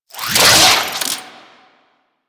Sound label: Necramech Repair Drone Claw Spin; Enemies; Warframe